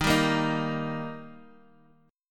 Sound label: Eb Major, down, Guitar, DADGAD